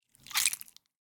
gore slime creature meat blood short movement various; sonniss gdc